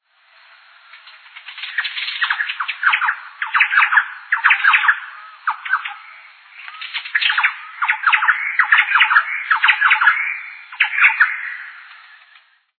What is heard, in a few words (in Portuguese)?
garrinchao